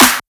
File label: Clap; osu